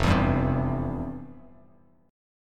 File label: F Major 7th Suspended 2nd, Bass Standard; strummed; FM7sus2; 1,7,5,5; JGuitar